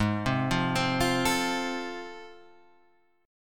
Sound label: F Major, guitar chord; F/Ab arpeggiated